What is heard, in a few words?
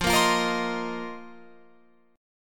F 7th Suspended 4th F down Guitar Open F